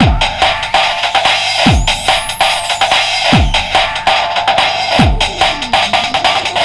144.3bpm, Breaks; POTBreaks

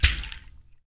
HAND, Afro Shakers, Ankle Pods; Roland LCDP14 Africa VOL-2

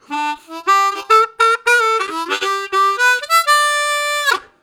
Going Up, Harmonica, Riffs; Zero G - Harmonica

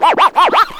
hardcore scratch, Vinyl Scratches

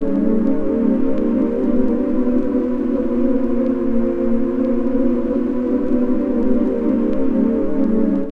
Chords Tones; Equipped Music; Loungin House

